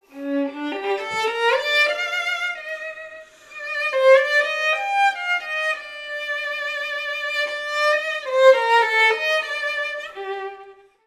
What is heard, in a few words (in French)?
violon